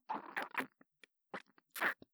くちゅくちゅ, 効果音

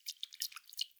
くちゅ 中; くちゅっ 短め

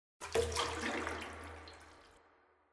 お湯につかる リバーブ; おまけ 単発効果音

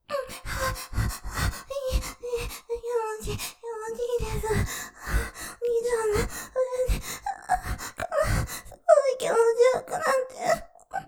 喘ぎ; いい、いい、気持ちいいです、すごい気持ちよく; ループで楽しむ！シェフの気まぐれ抜き抜きコース

